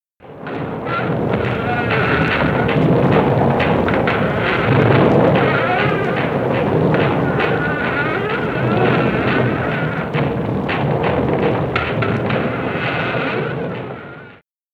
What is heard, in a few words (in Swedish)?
Väderkvarn under gång